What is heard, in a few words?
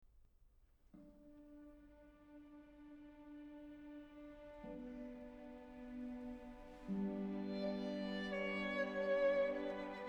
Violin Concertos; Bartók